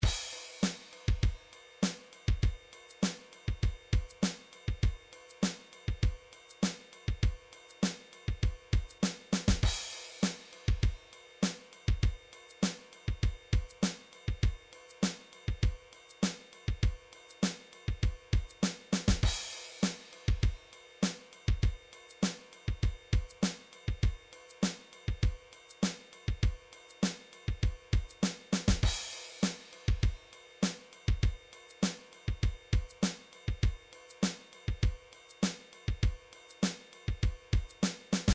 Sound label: drums pop rock, midi drums